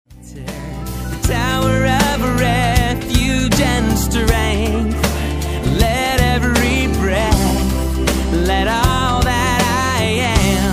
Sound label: Shout